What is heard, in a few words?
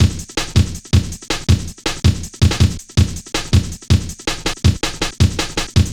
boom, Drum Loops; Zero-G Jungle Warfare Complete